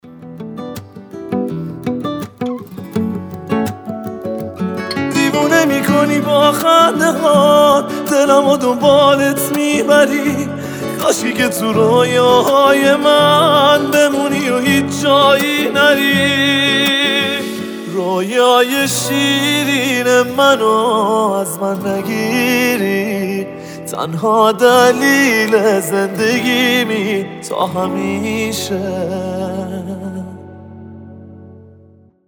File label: guitar